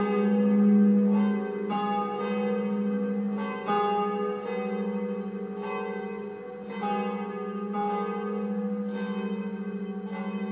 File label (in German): lärmbelästigung